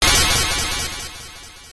SE Confession Failure; doukyusei pc 9801

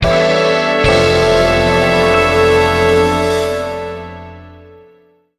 Exiting Vehicle; The Simpsons Hit & Run; PS2; Wolves Stole My Pills!

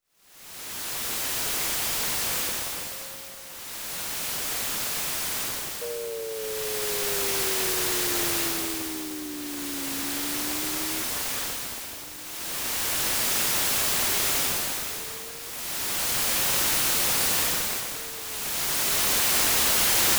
Waves; uncharted waters x68000

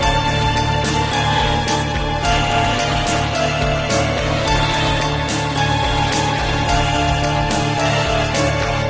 Music - Scary; 7 Wonders of the Ancient World

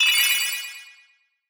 Fairy bullet; Hilzartov; Fairy Size Doesn't Matter

